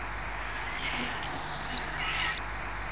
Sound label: Apus apus